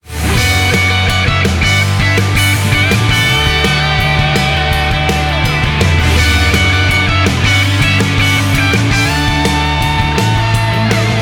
stage clear; no straight roads

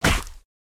Chop Impact; Caffiend; Going Under

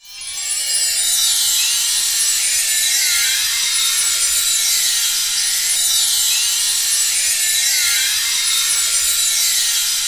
Enemy Masquerade Active Loop

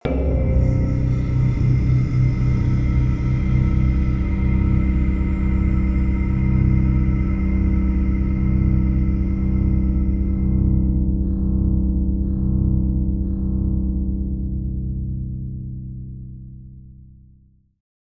death chime

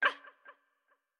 Skeleton Hurt

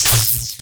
Thunderwall Hit; gamerip

